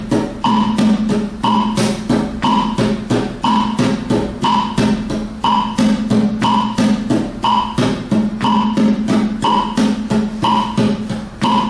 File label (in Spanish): Rasgueo, guitar